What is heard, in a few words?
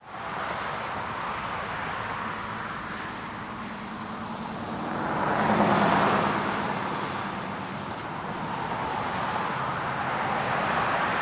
Gateway Arch Traffic heavy